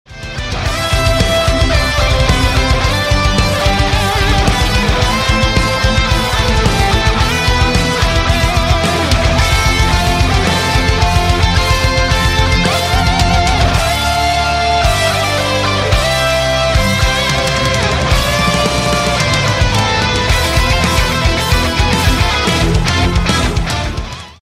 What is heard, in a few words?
instrumental